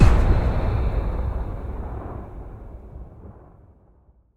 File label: gate lap